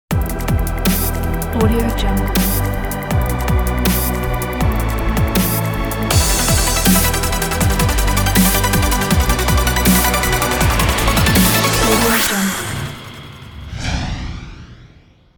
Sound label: dubstep logo